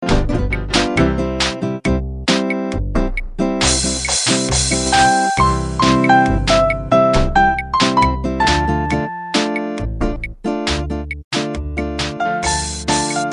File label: bossa